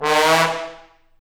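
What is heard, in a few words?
trombone slide; Roland L-CDC-01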